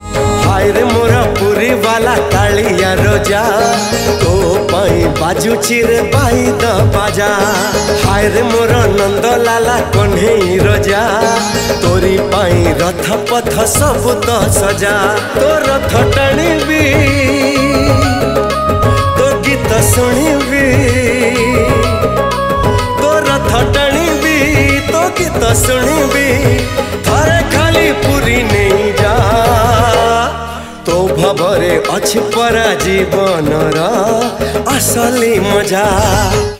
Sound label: Odia Jagannath Bhajan Song